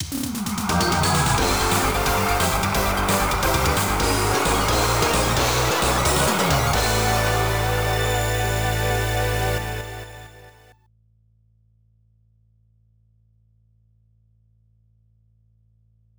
Goal; Racin' Force; arcade

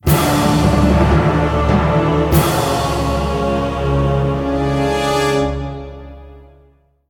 loss sting

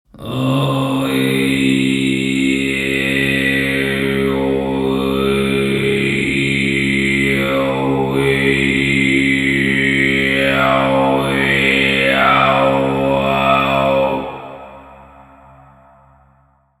Throat singing; Oriental Empires

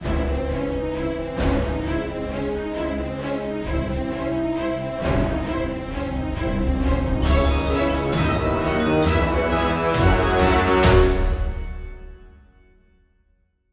Round Start Halloween

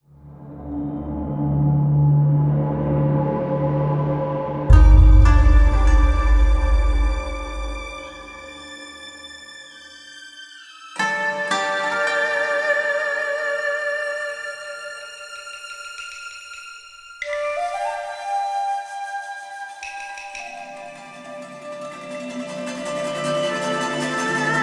music stinger

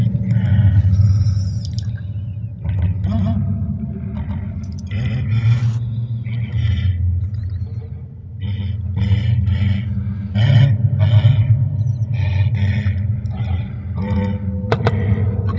whale talk

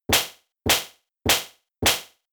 Slap; Double Slap 2hits

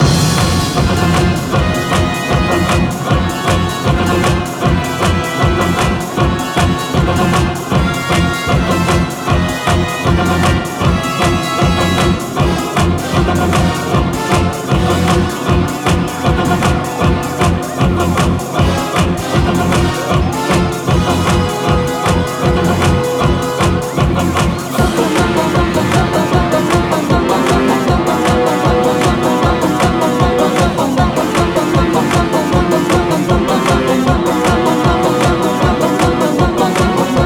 Music Race Winning

